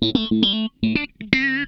FAZE PLUK, Funk Guitar; 90 s Sample CDs; Zero G

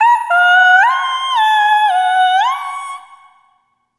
Arrythmic Atonal

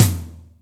Room, Acoustic Tom; Yamaha DTXpress

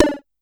Pollard Syndrum

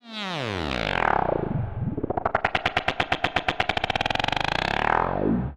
Odd Modular; radiosonics

